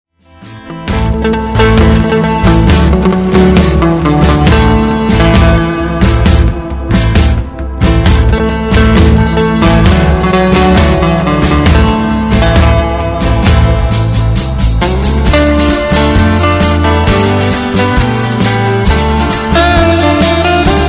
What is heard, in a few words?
Gold Grade Neck, guitar pickups